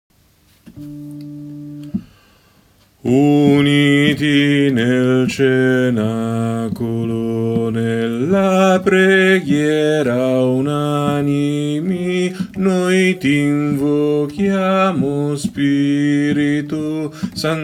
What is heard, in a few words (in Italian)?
Uniti Nel Cenacolo CONTRALTI